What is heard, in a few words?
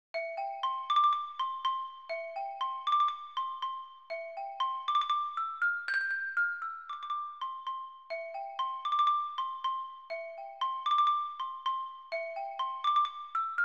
Xylophone; GPO